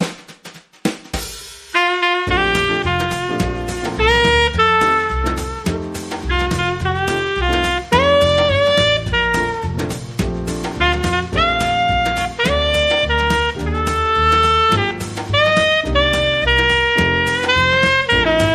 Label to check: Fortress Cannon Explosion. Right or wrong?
Wrong